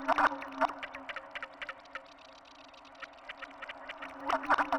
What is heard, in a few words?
Warp Wave Drum; Rhythmic Atmospheres